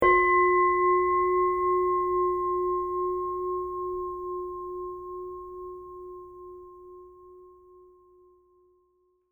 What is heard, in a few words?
zen bell, ringtones